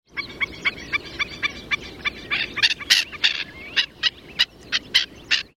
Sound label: Black neck Stilt